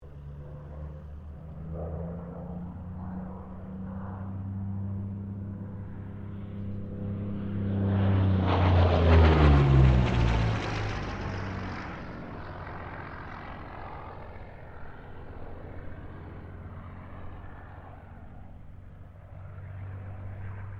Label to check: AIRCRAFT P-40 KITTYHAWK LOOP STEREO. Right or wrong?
Right